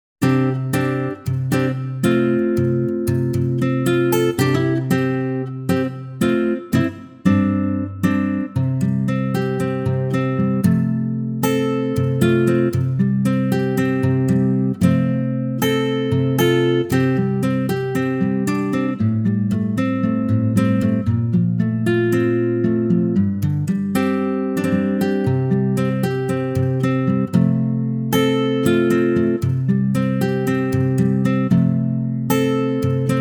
ac gtr C; C Acoustic Guitar; key - C - vocal range - G to A

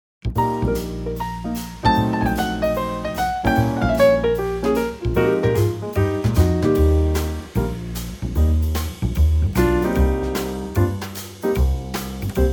Eb trio; key - Eb - vocal range - Eb to F

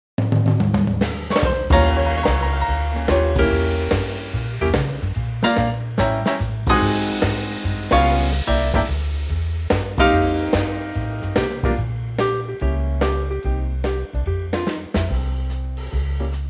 C trio; key - C - vocal range - C to E

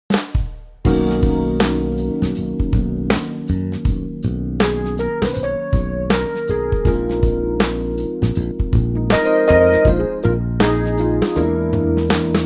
Eb groove

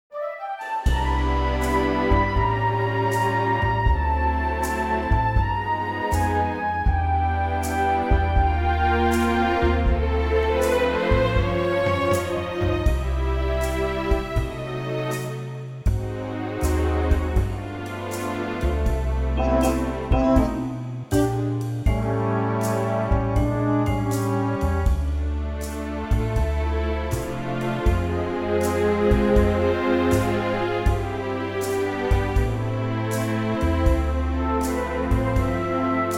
C orchestral

